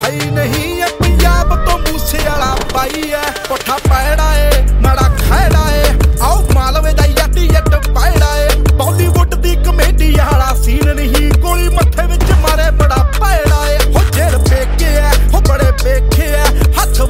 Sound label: Ringtone